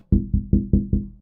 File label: window, doorKnocking